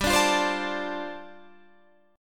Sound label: G 9th Suspended 4th; Listen to G9sus4 strummed; G9sus4 Chord